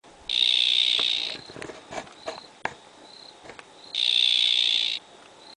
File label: Excidobates mysteriosus